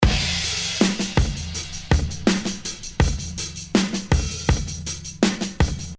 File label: Easy Breezy Drum Groove (162); CRATES & BREAKS VOL.1 - BEEJ; DRUM GROOVES